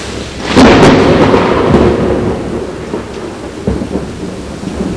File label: storm